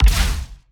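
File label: Laser gun Ballista Fire Short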